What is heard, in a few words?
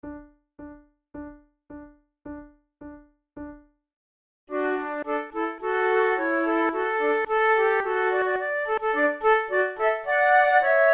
march trio, flute